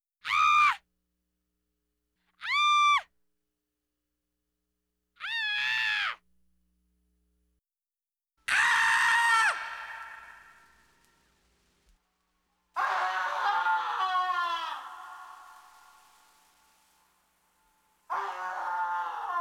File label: various Screams